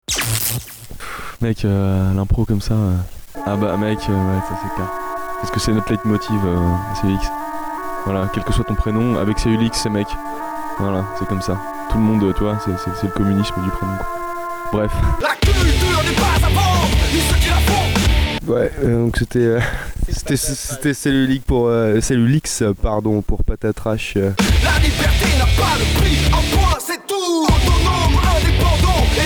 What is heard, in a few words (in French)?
jingle